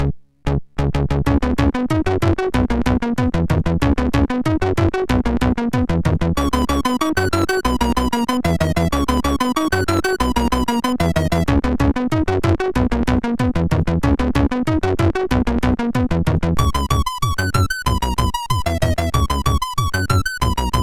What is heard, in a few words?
Dance, MOS6581R4 SID; Creo